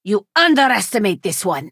burglar attack

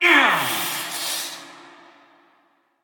cursed spectre attack

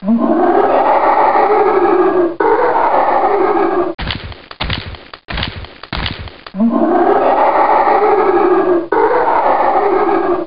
Lich

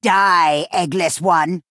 venomtongue attack; archein

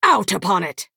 attack; daggerfall mage

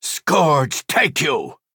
attack; redoran enforcer

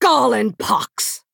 attack; daggerfall mage

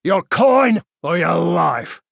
attack; south road brigand

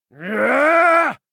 attack; mage slayer

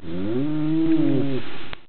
creature Lizard man

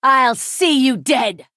sorcerer attack; iliac; Breton; Heroes of Skyrim expansion

